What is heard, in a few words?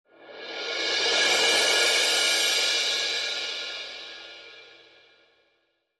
Reflections Cymbal Roll